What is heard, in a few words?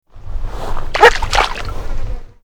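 Rana catesbeiana chirp, frogs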